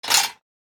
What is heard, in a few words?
clang; SimplyGladiators